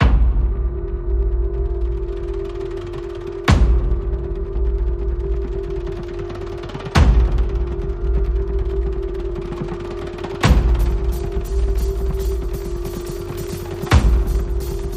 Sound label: Drum Driving, No Pulses